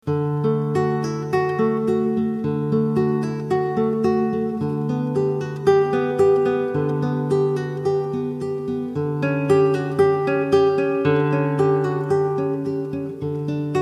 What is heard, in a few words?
prelude d